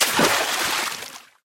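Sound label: MILK SPLASH; VSPLESK; zoochosis; lazynap pack 2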